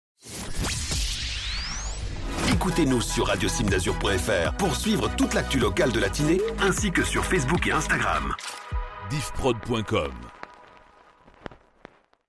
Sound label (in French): Jingle Actu locale; Radio Cimes DAzur